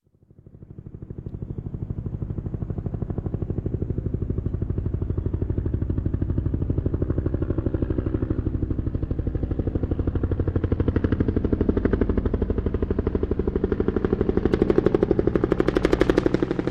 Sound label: Helicopter Army Approach And Pass; Sound Ideas 2000 Series